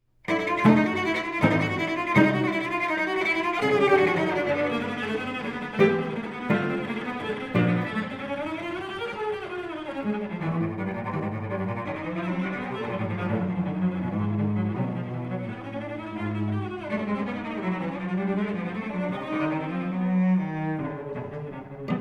inventions for two cellos vivace

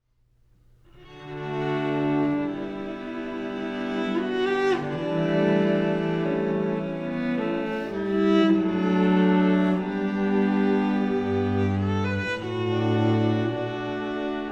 suite for two cellos sarabande lento

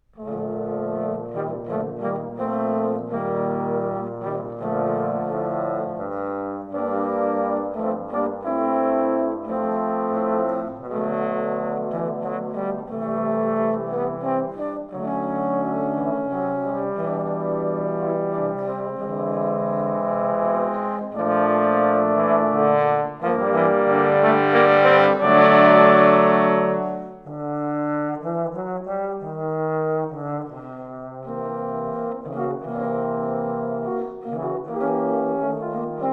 trombone quartet prelude

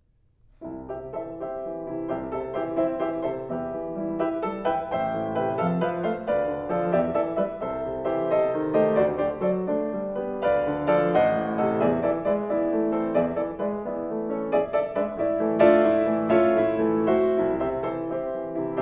four piano pieces

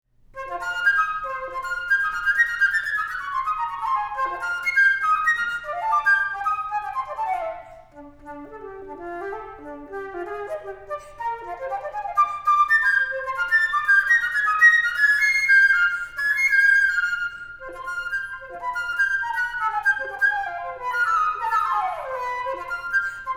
solo flute; three preludes for solo flute

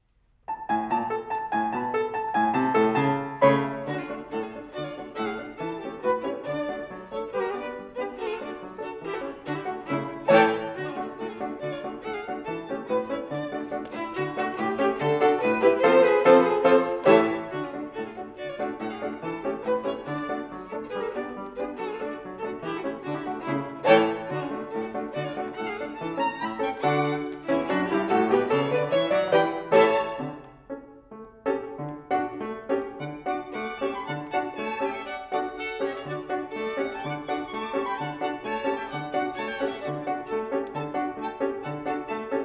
polka

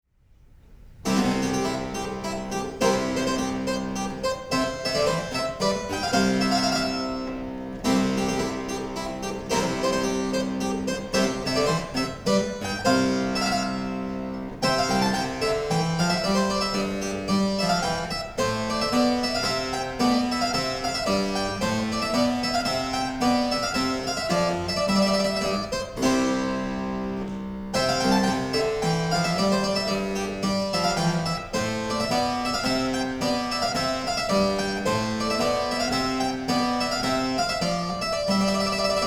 trumpet tune

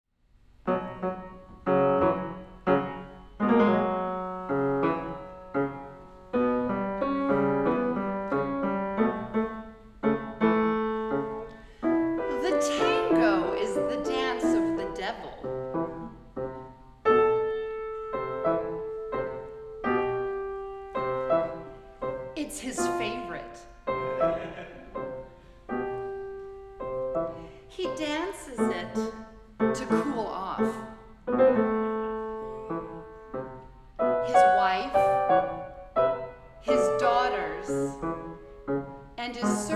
tango

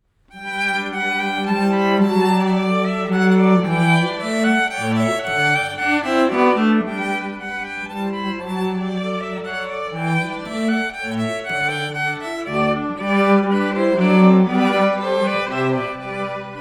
minuet

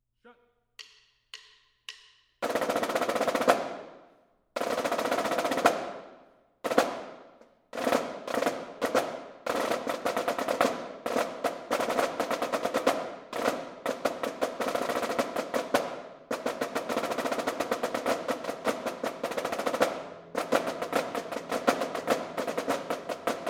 pipers noise

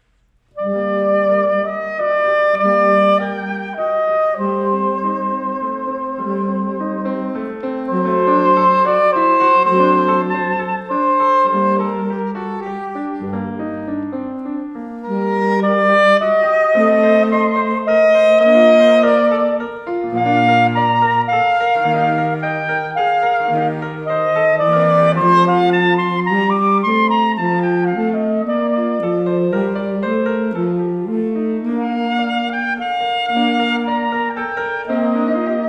siciliano

